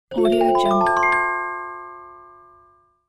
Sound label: magic ascending ring bells; game